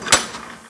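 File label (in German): Einfüllstutzen; PanzerE; Desert; CD-Gamer 1999-10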